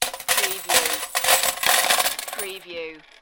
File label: Slot Machine Win - Coins Falling Into Payout Slot